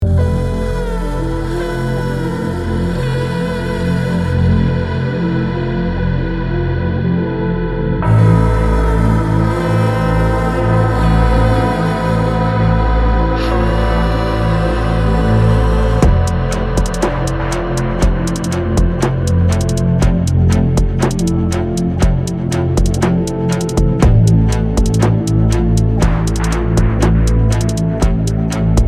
hall bgm; LoveLegendAssets; MiniGame

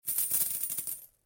coin fly; BallCrushBest; LoveLegendAssets

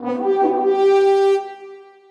after level toast; RedHotRoast; GemCrushAssets

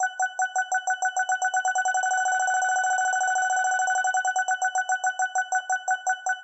wheel spin; RedHotRoast

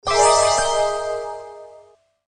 wheel show; BingoGrassland

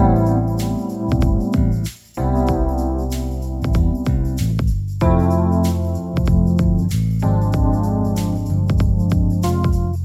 bgm play; BingoGrassland

